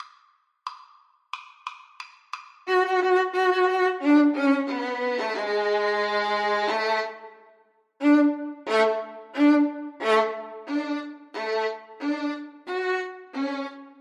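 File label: radetsky march violins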